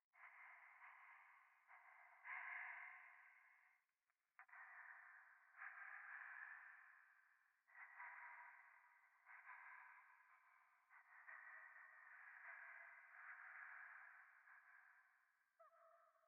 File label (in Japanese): ゴースト 左耳快感吐息